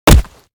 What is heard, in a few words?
bullet hit; STALKER; IWP